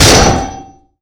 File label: Metal Heavy Impact; Impacts & Destruction Sweeteners; Sony Pictures Sound Effects Series Volumes 1-10; Sony Creative Software